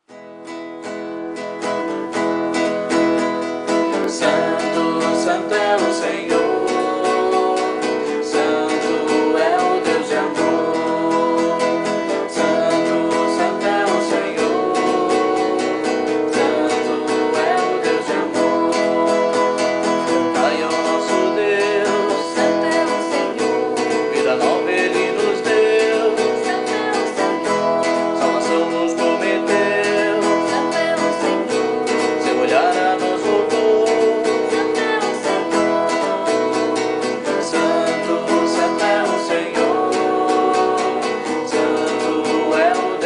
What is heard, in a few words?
vocal